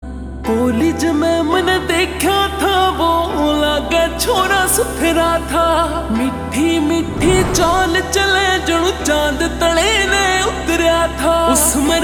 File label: Slow Reverb Version Ringtone